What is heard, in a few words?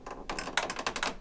Creak Wood Floor